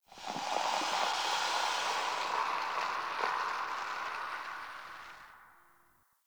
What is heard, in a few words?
Bar Beer Tap Pour; Warframe; Nineteen Ninety Nine; Hub; Hub Pub